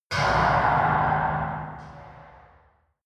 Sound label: metal hard clunk